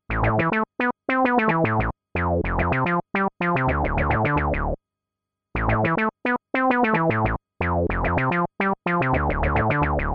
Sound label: Funky Bass